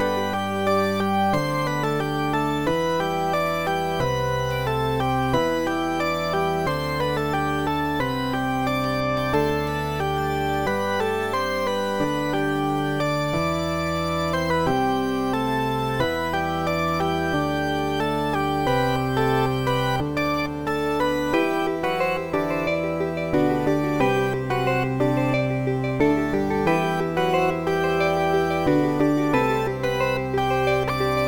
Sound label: church theam